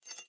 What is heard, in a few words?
Maze Retro Game Chain Pull